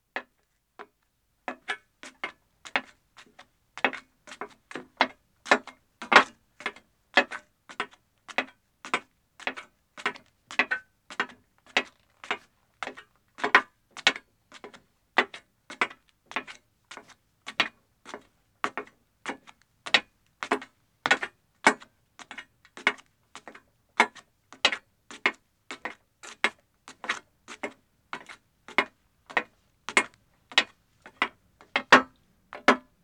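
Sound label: Metal Stairs Ascending, 1 Woman, footsteps; BBC Sound Effects Library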